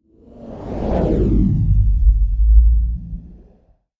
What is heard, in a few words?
Deep Low Flyby